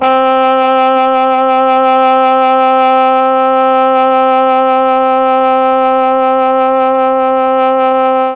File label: C3 TROMBONE, mellotron; CHMB TRMBONE